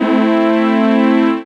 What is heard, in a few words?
techno; E-Lab; X Static Goldmine 1